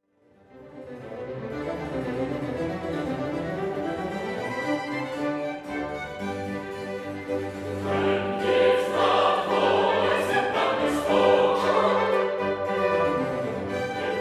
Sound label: Chorus; Excerpt from Jephtha - When his loud voice